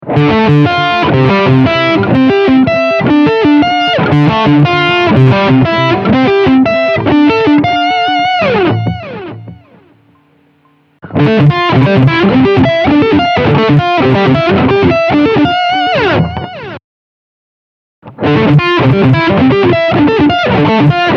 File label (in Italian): triadi late ad ottave